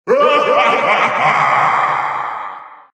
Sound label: Laugh; Master Hand